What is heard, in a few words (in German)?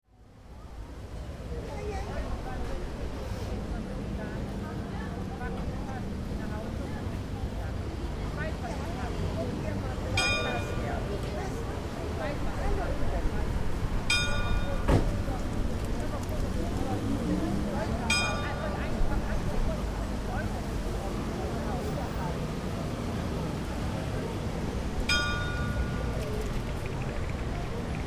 glockenlaeuten der kapelle; zu den heiligen rochus dreikoenigen bartholomaeus; in bickendorf; zu den heiligen rochus dreikonigen und bartholomaus in bickendorf